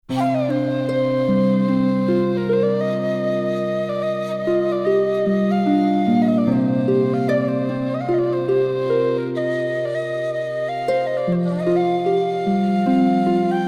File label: no strings in first half; Iran Film